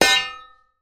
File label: impact metal heavy thump clunk